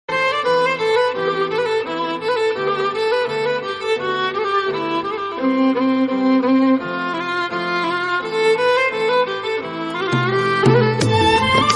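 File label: classic love ringtone; nokia ringtones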